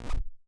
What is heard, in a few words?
Scratch, drum